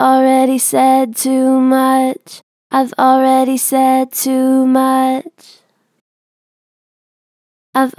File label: Gen Vib Already said too much, Vocals, Natural Vibrato Gentle; Harmor Vocals